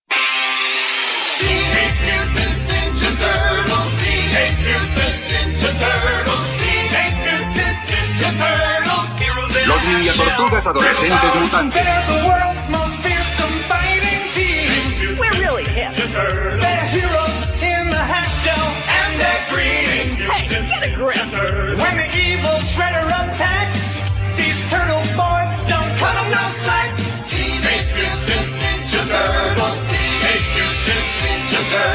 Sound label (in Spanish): Opening Latino; Tortugas Ninja